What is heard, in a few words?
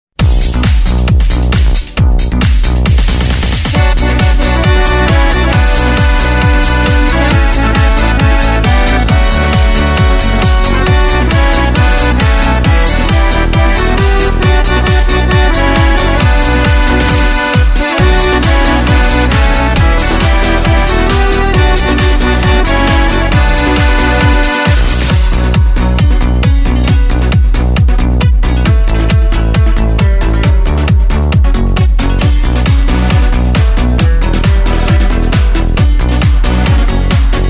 Korg Pa3X